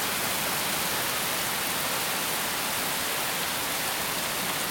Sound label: pouring rain, weather; Cosmic Rage